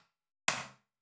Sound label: bottle, ethno, 125